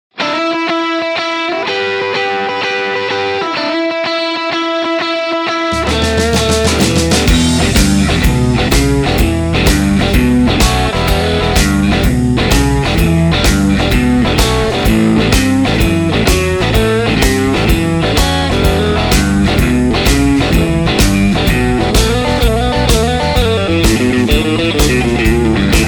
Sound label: main riff